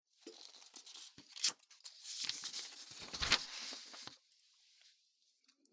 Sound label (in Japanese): 台本めくる音; オマケ オイル耳かき差分+α